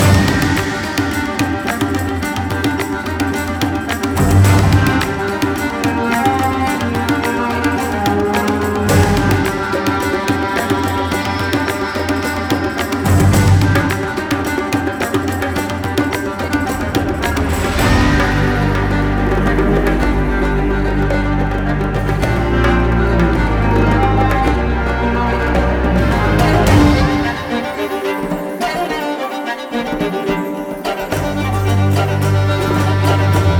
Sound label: Storming In (High); Assassin's Creed Origins; PS4 Windows Xbox One